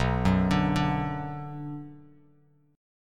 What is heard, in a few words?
B Minor B Bass Standard; arpeggiated; JGuitar